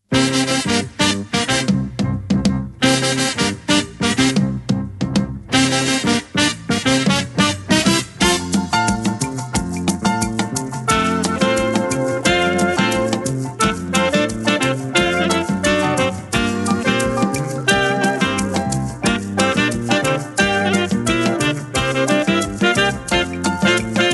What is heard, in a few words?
rhumba; SkatingSchool